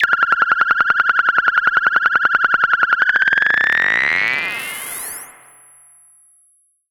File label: FX, EMS VCS3